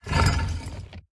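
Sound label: movement armor heavy; smite; god atlas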